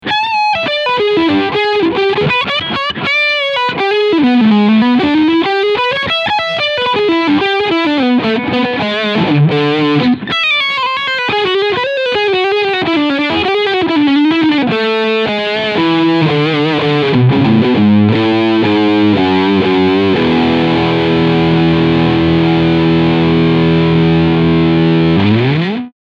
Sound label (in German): Gibson Les Paul 68 Custom Reissue Leadsound Steg Pickup